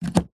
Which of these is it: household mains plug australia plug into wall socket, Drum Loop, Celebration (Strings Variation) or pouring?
household mains plug australia plug into wall socket